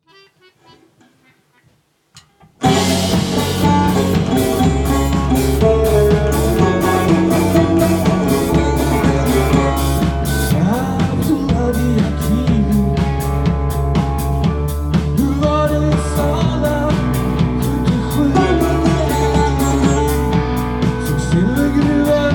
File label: Saz